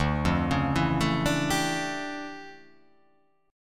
D Minor Major 11th, down; DmM11; arpeggiated

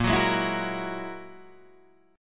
Ab 7th Suspended 2nd Sharp 5th, chord

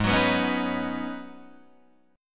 Ab Minor 11th, Ab, Guitar, DADGAD

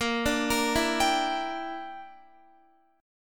A sharp 6th Flat 5th chord, Guitar; A#6b5 arpeggiated; JGuitar

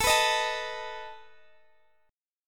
A Suspended 2nd Flat 5th A down; Asus2b5; strummed

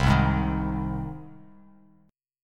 C Minor Sharp 5th C down Bass Standard; strummed; JGuitar

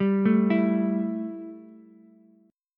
E Minor Double Flat 5th E Ukulele; Embb5 arpeggiated; x,7,5,7

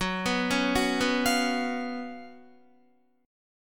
Gb Major 7th Suspended 4th Gb Guitar

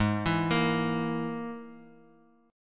Ab Suspended 2nd Sharp 5th Ab, Guitar Open G; down

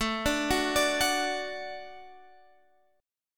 A 6th Suspended 4th, chord; 6sus4:1,4,(5),6

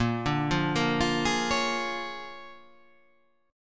A sharp 7th Sharp 9th

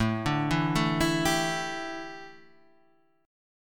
A 7th Suspended 4th, A, down

